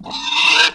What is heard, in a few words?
HURT